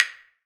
BANGU WET; INIS - Opium; PERCS-KIT

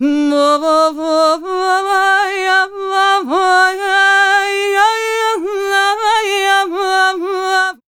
MEH1 VOX, Balkanish Traditions

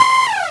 Synths Root C; Vengeance - Essential Clubsounds Vol.4; Synth Shots and Basses; Note C

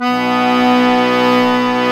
Accordians, Accord Duo; Club-50 - Foundations Roland

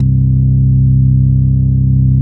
MELO PERC O

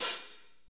hi-hat, Slow Shuffle; Northstar; Drumscapes Roland

